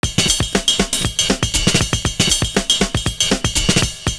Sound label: BELL CRASH; Univers Sons